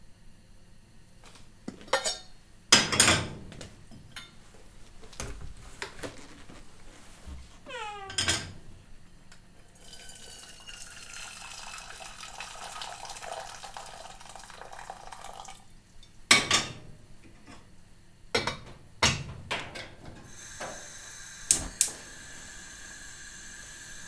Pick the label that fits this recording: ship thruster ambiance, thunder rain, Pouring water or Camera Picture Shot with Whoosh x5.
Pouring water